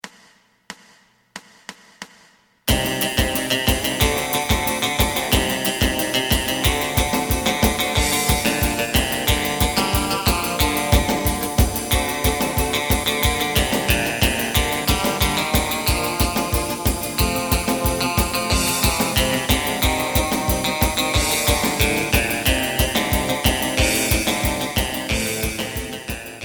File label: bass